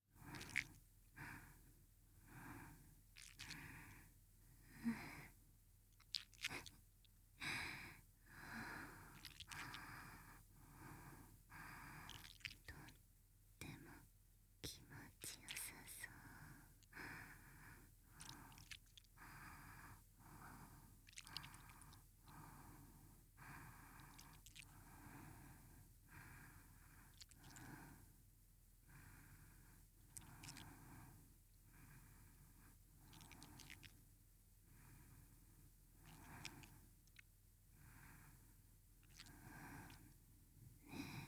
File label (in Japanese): 吐息+乳首弄りループ（少し激しく）